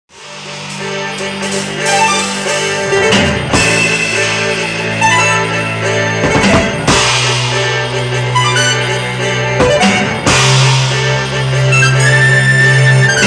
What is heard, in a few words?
keys